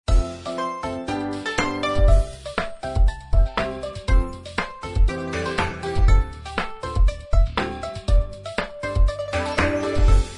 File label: bg music